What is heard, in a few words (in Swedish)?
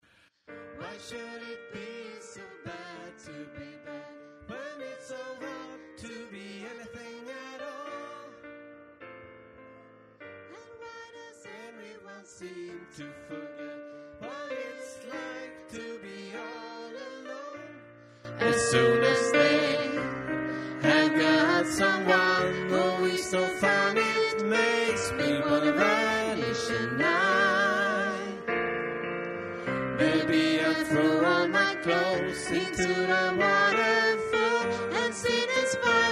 sopran; stammor